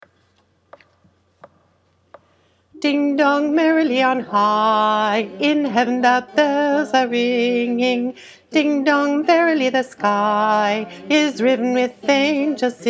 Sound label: ding dong alto